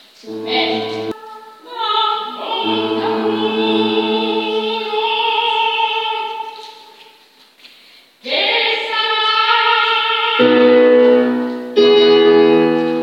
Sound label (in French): tambour mezzi avec piano